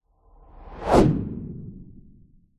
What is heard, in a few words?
sound design cinematic short whoosh; zapsplat